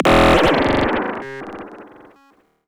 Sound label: rubber zap